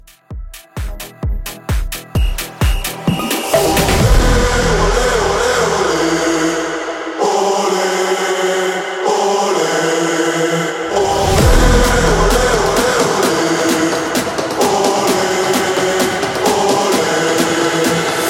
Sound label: Edit 130